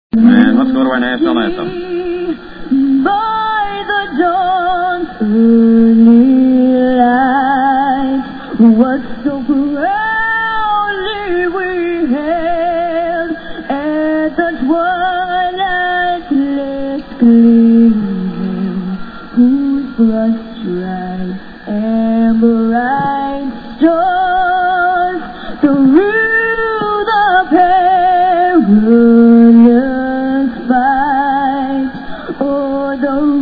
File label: American National Anthem